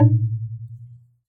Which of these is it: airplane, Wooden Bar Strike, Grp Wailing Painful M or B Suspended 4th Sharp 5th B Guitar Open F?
Wooden Bar Strike